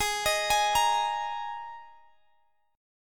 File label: D sharp Suspended 4th G sharp